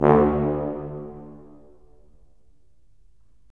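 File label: brass tuba; LA Composer vol. 3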